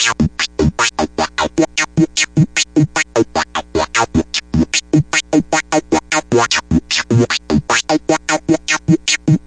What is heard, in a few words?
too much tweakin synth, 101; SYNTH AND ELECTRONIC LOOPS